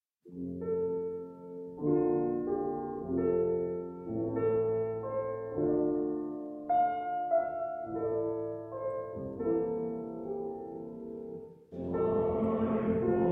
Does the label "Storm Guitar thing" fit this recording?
No